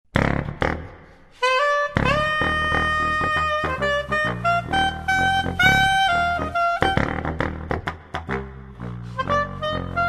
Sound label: soprillo; ドイツ製 世界最小、最高音域のピッコロサックス